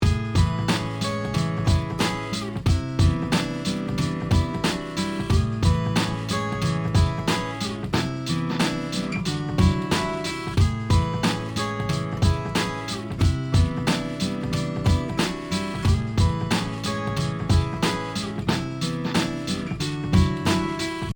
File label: ROYER R121